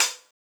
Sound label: Hihat Closed Buzz